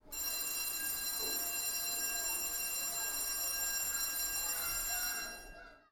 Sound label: Fire Bell; Sonic Snaps